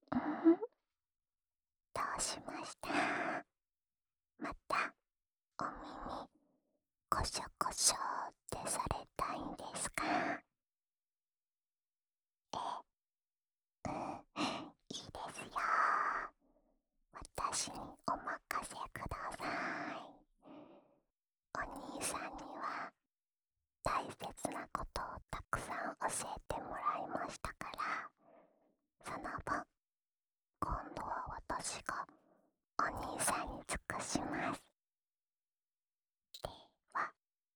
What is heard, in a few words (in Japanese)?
安眠用お耳ふーふー（左耳）; ASMR